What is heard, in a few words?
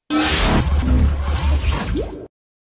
Portal Gun Sound Effect